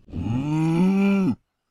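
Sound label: minotaur random, mobsounds; server-nalc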